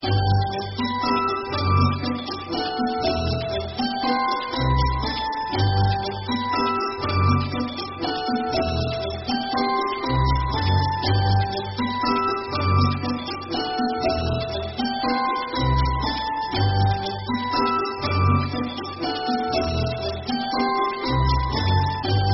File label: christmas card music